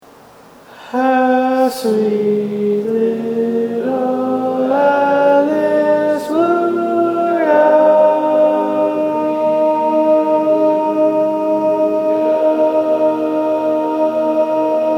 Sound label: Tenor, barbershop